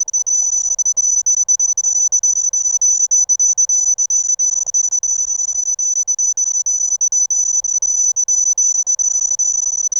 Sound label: a text popping up and, mobile